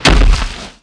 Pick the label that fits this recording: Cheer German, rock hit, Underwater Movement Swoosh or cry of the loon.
rock hit